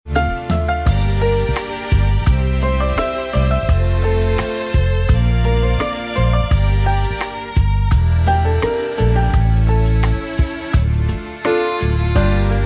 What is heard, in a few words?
latin tour; ВидеоМОНТАЖ 23.0; ams music library